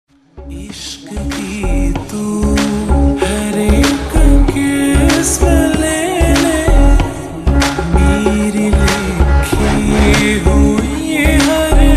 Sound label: Slowed Reverb Ringtone